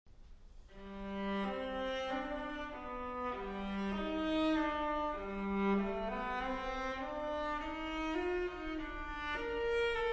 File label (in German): Streichquartett c-Moll - Finale - Sehr langsam; Hans Rott; Mainzer Streichquartett